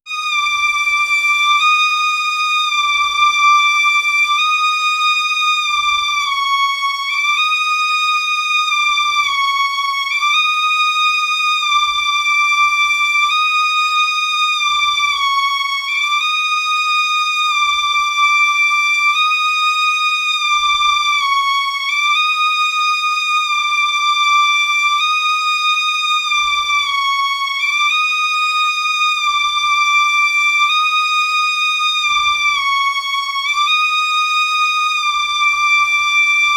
violino repetitivo e agud

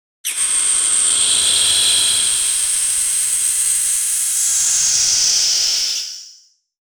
crie um som de ar

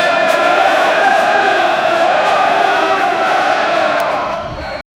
faa sons de jogadores de, volleyball

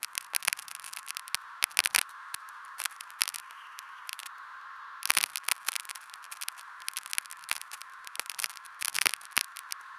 som de granola, crunch

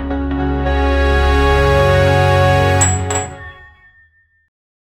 som de desafio, challenge

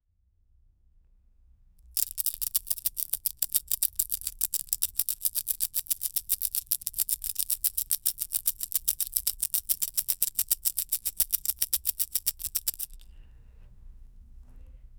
um som de peido longo; humor

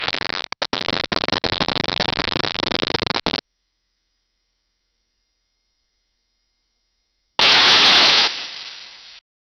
câmera falhando, broken

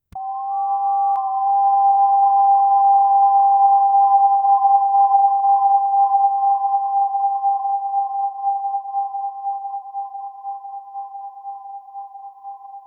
faca um som de rajada, superhero

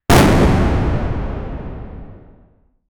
um som semelhante a um, thunder

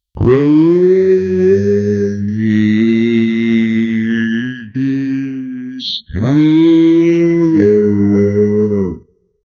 faa um canto de um, dinosaur

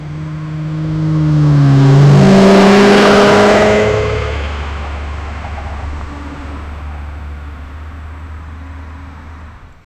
um carro grande acelerando; offroad

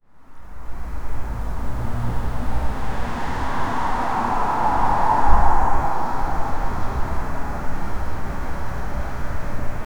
uma rua escura medieval d, contemplative

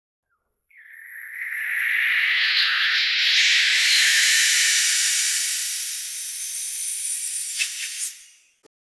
som de nave aliengena abr

